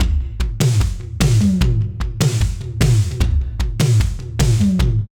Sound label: Simmons SDSV